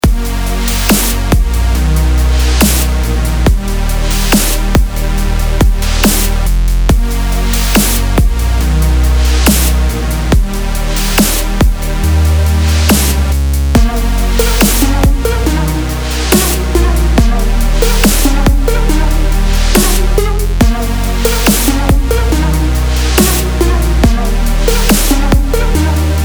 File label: Electronic; ESPN; SpeedRacer